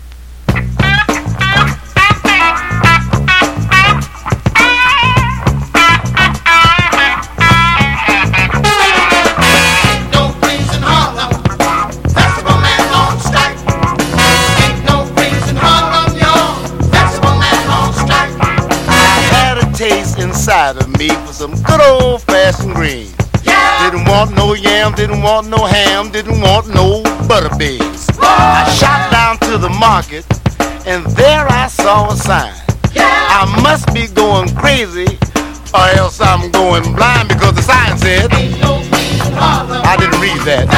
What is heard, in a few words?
vibrations